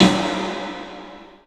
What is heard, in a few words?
PA DEEP L, CLUB SN VRB; 3D Sound; 3D Drums